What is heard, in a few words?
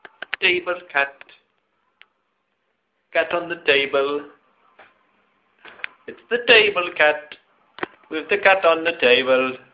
ringtone